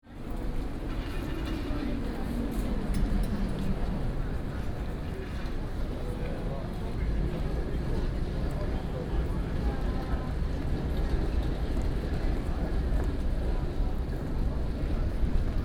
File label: sirens